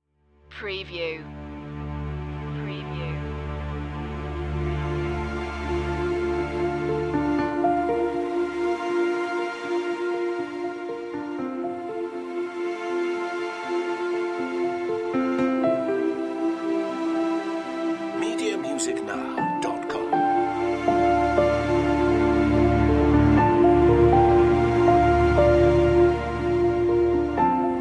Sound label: passing time, Romantic Nostalgic / Thoughtful